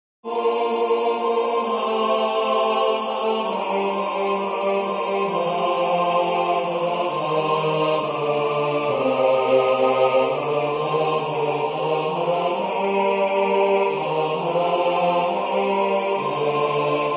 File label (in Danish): bas